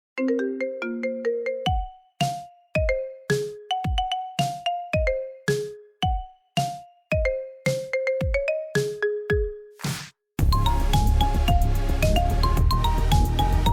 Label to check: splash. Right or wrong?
Wrong